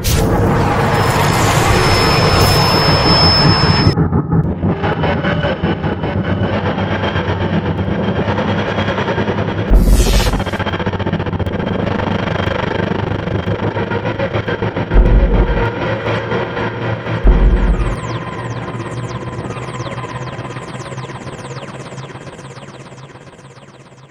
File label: demat damaged, tardis; drmatt